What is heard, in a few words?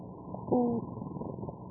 Opsanus tau, filtered; Cotuit; soniferous